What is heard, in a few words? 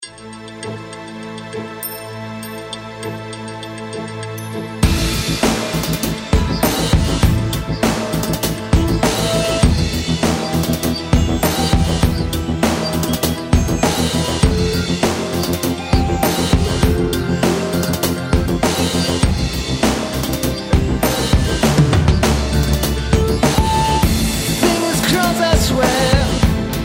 rockpop; Sound PooL